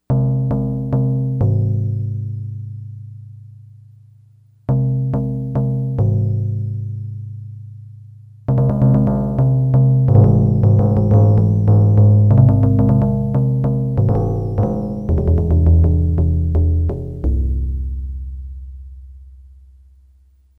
Timpani, Yamaha DX100; Factory Patches

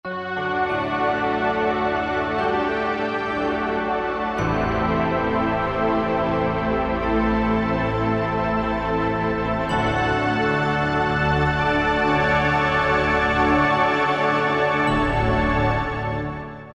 Bell Strings; Optical Media International Sonic Images Library

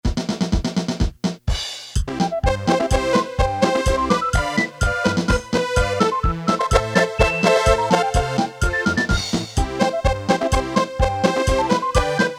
March, Casio CT-370, Rhythms

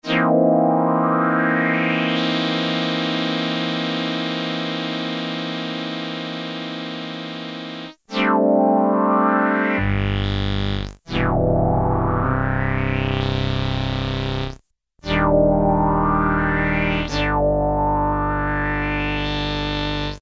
Reverse Bowed Synthe, Korg Poly-800

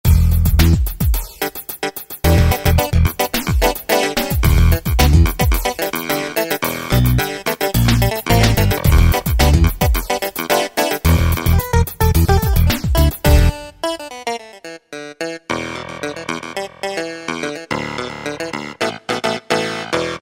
Clav Funk, E-mu PK-6

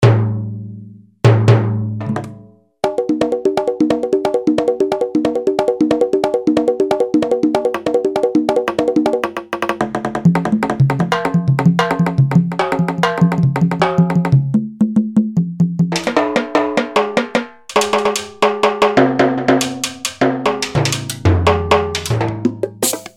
Latin Drums, E-mu Pro-Cussion; Factory ROM kits

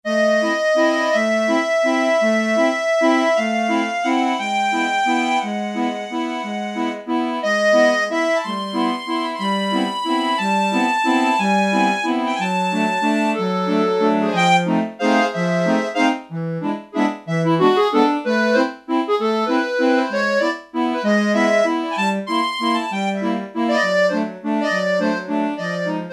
Yamaha PSR-300 ACCORDION, Voices

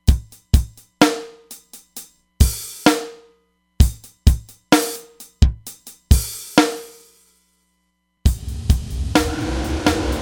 Spinners; Roland DEP-5; Factory Patches

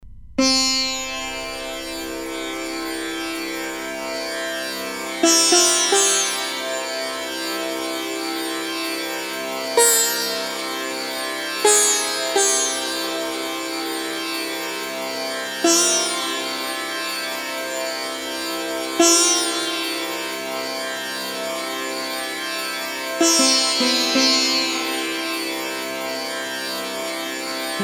Raga, E-mu Proteus-3

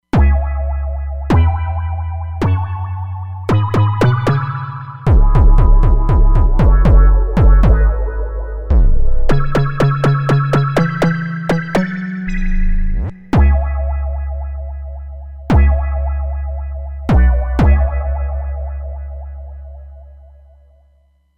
Roland Fantom XR; Mojo Man

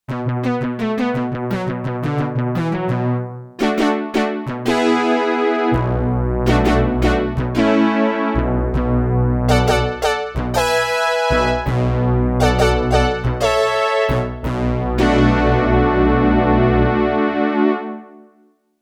Analog Spread, Yamaha TX802; Performances; Factory Patches

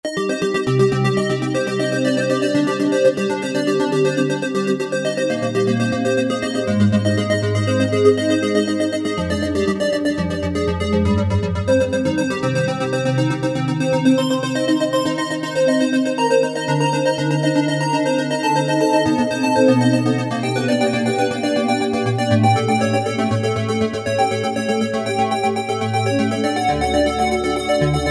Chatter Arp

